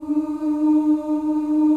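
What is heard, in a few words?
E3 FEMALE CHOIR, OOS; Northstar - New Gold VOL-2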